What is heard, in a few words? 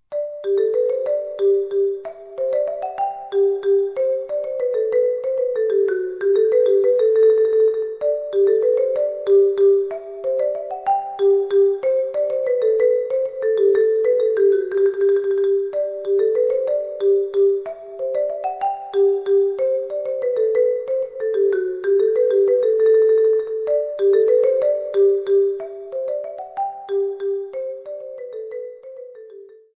menuet